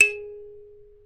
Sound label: Dance Drums; Best Service ProSamples vol.15; AKAI